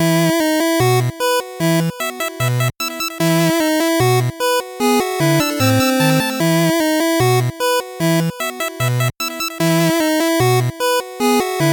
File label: 8bit bgm slow